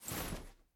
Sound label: armor short; smite; hera